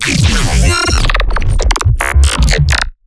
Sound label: glitch stab; Virtual Riot; Glitch Stabs